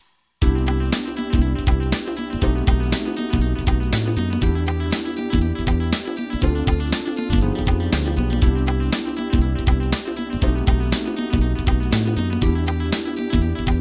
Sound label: Groove Funk